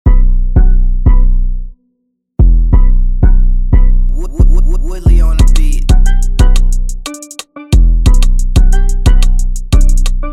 Instrumental

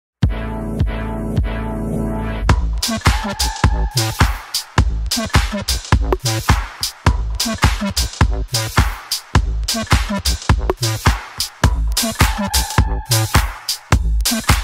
Instrumental

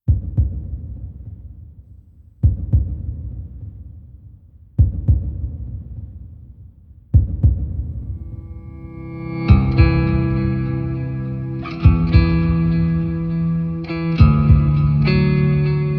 instrumental

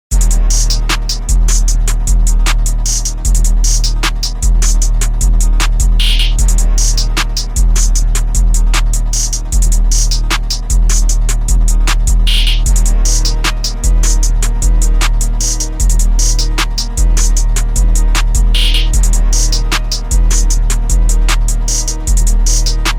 Instrumental

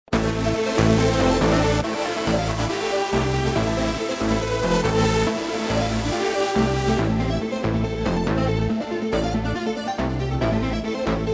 Instrumental

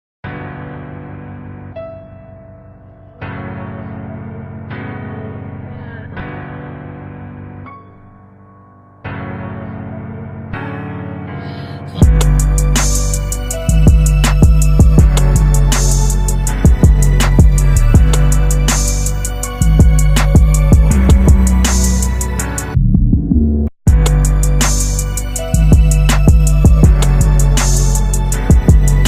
Instrumental

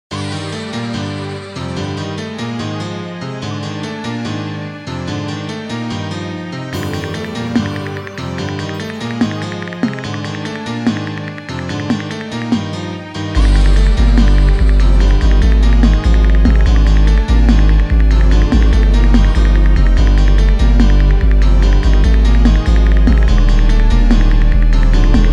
Instrumental

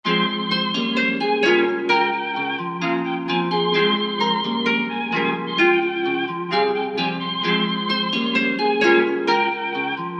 130 F minor

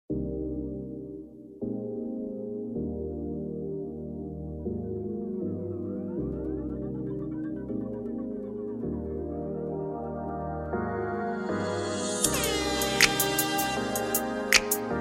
Interlude Instrumental

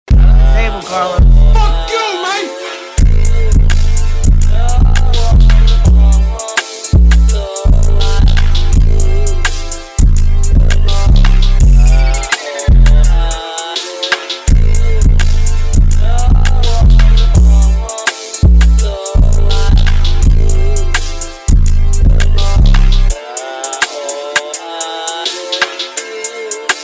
Instrumental

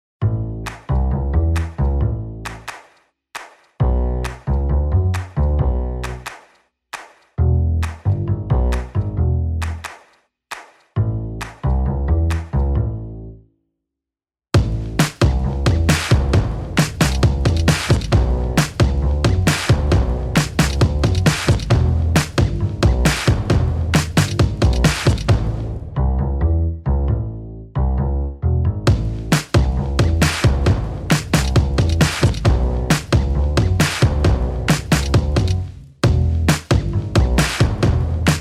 Instrumental

